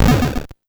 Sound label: cleaver pickup louder